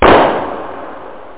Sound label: HAND CLAPS